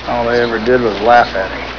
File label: laugh